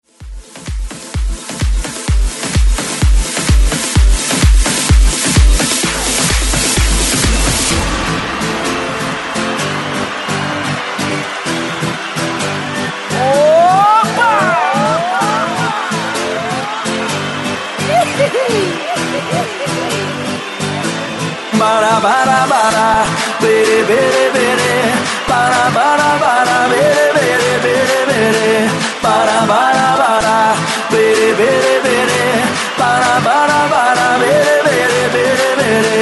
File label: Party Mix Break 128 Bpm